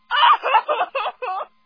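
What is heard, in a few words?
strong female crying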